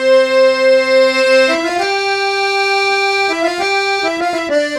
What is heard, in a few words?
Accordion, Trip-Hop Loops; Sonic Foundry (Sony Creative Software) - Rondo Brothers Trip-Hop Loops & Samples; El Corazon